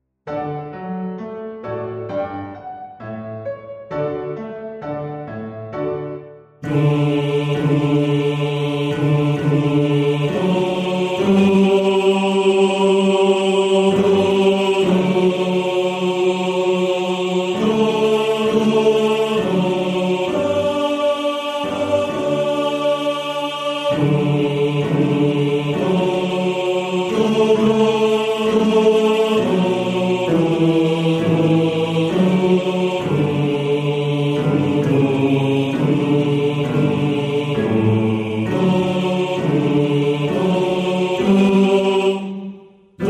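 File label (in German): Bass 1, Männerchor; Christenglaube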